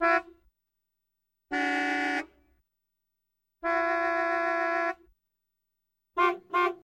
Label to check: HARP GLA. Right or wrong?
Wrong